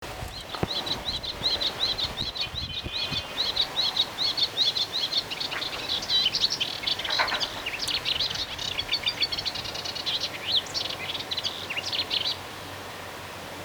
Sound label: Melodious Warbler